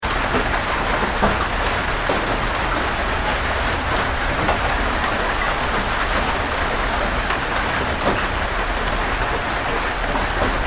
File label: weather rain med boatshed internal, Rain (Medium) On Boatshed; Rain ( Medium ) Falling On Boatshed Roof ( Internal Recording ) With Dripping And Some Dis